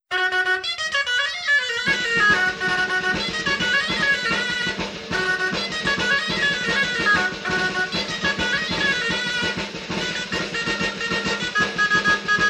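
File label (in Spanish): Entradilla, Bailes tradicionales en Valladolid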